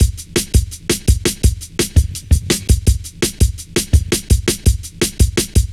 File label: Fat Stepz, Drum Loops 167.5; Zero-G Jungle Warfare 1, 2 & 3